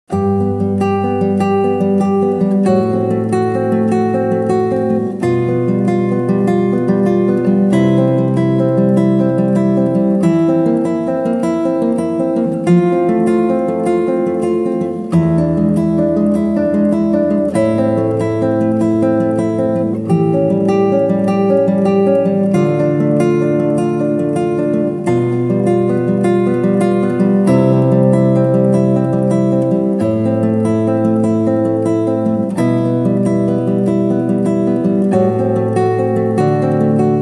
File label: Melody in G major